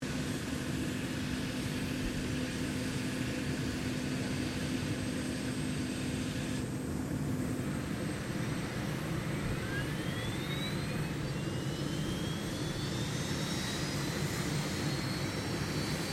HELICOPTER 1990 SIKORSKY UH60 BLACKHAWK TURBINE STARTUP; Helicopters; Vehicles; Aircraft Collection